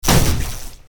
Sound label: overload zap; cstrike; war3source